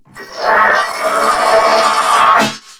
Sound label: Death; Warframe; Corpus Crewmen; Crewman C; Dialog Barks Battle